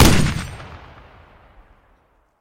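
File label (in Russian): одиночный выстрел в тоннеле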